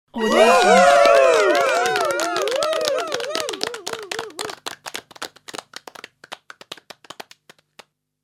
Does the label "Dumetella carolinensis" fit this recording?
No